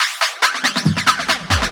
Loops Fills; Vengeance Samples

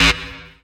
Synths; Vengeance Samples

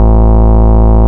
Bass Root A

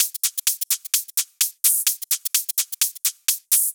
Drumloops 128BPM

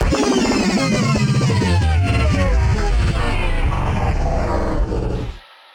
Slow stop

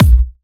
Hard Kick; Vengeance Samples

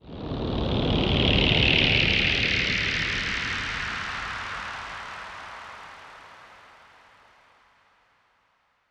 Downlifter

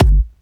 Bassdrums Tekhouse; Kicks; Vengeance Samples

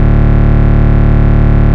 Bass D, Root D

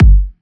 Bassdrums; Vengeance Samples

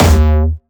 D#2, Jumpstyle Kick; vengeance essential club sounds-3; VEC3 Bassdrums

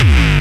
BD Distortion, Bassdrums; Vengeance Samples

